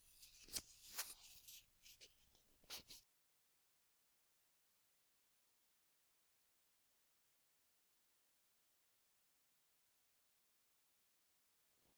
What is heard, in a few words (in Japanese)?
(右)綿棒 耳奥をコスコスする音; 耳かき音部位分けVer.